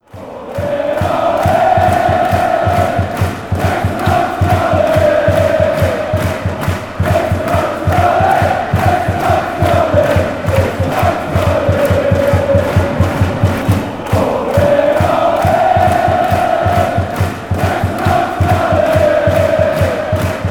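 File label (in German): Torhymne and Fangesänge; hannover 96; hsv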